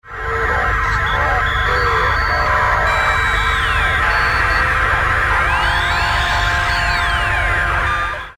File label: shortwave; Phantoms